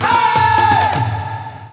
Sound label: win